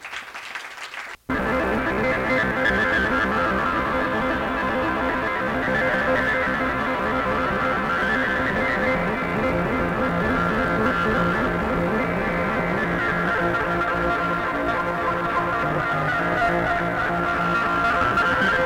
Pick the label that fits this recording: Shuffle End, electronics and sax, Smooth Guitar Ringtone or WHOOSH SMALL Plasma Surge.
electronics and sax